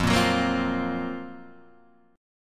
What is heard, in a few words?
F sharp Major Sharp 11th F